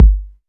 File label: Bassdrum